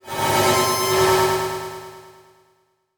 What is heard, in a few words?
Transition Sweep Laser; Production Element; Title Transition; AdobeCC